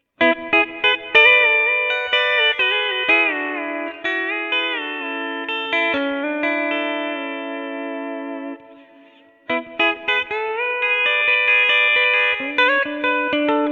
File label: Vibra CC